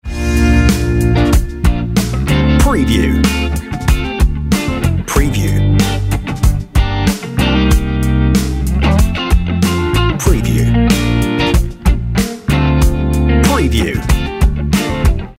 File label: On Hold Music Warm Delight